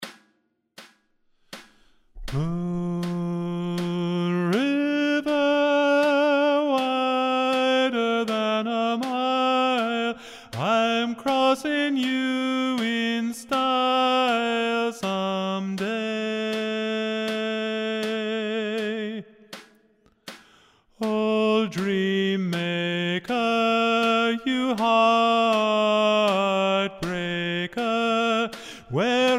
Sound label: Bass